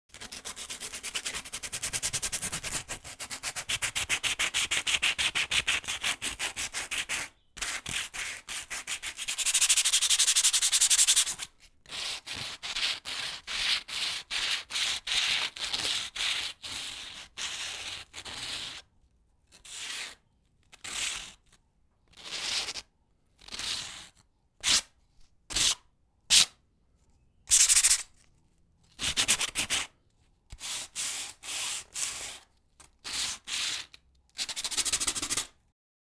Sanding Paper On Wood

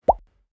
Pop

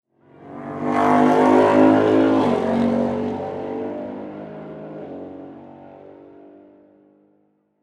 Speedway

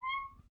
Metal Squeak

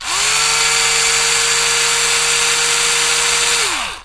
Electric screwdriver

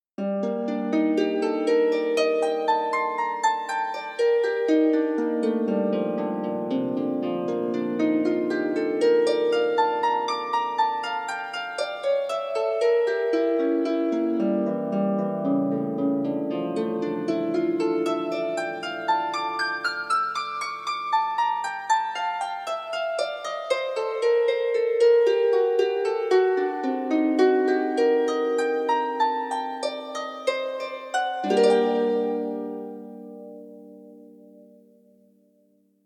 Harp Arpeggios

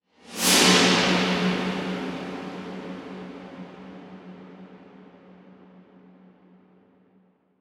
Deep Swoosh

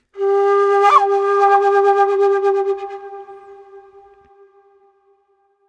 Indian Flute Signal

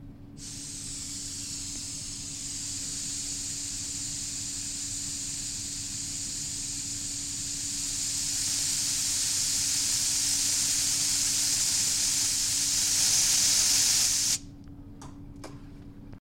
Air Compressor

